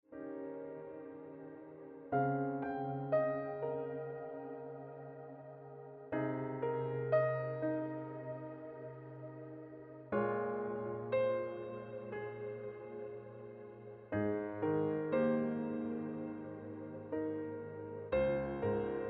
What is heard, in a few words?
Ringtone Piano Music